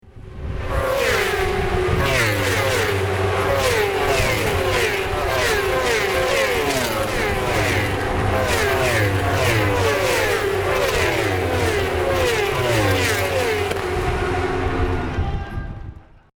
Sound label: Racing SE